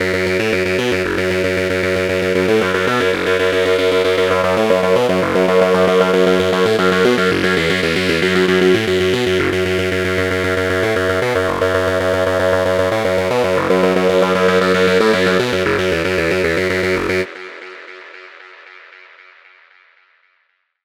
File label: psy stalker; porphyra hybrid by ocean swift synthesis